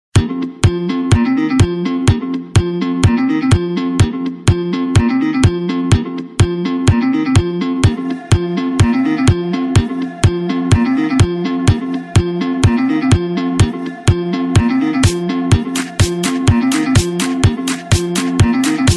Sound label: Country Ringtone